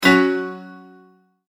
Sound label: multimedia tone exclamation; McKinney Sound